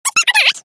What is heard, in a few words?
clock